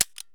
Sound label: pm trigger hammer; Escape from Tarkov